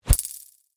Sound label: object pickup coins; lightning speed